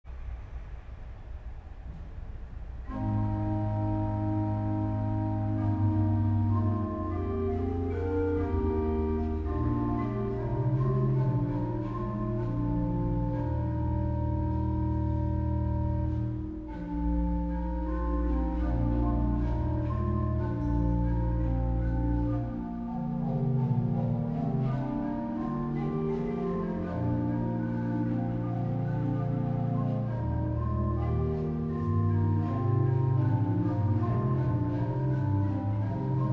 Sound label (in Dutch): improvisatie, kerk; oosthuizen